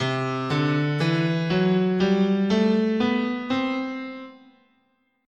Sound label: C Lydian, scale